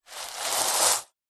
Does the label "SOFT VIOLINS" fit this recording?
No